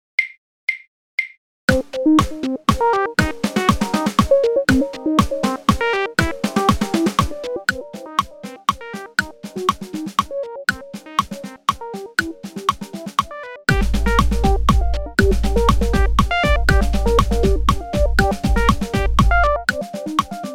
snare drum synth click 120 bpm; etude no 4

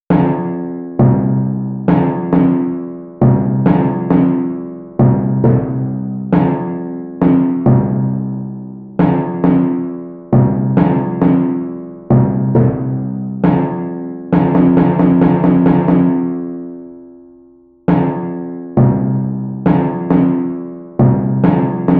puppy timpani

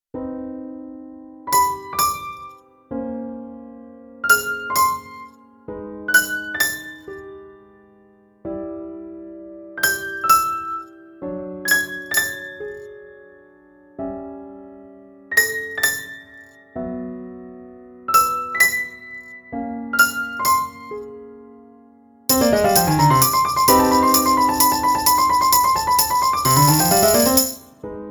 piano tambourin

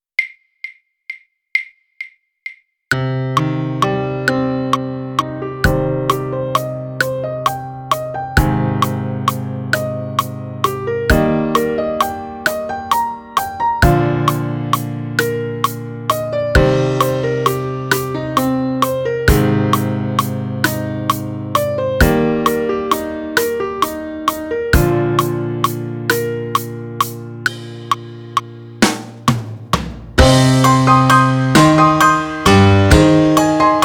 ballad piano drum kit click; balada

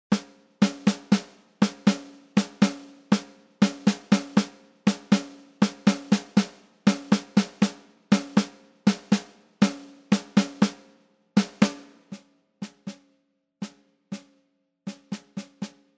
snare drum 120 bpm; etude no 2

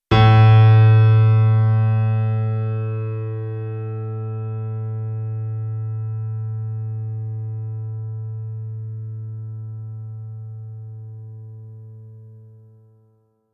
tuning a

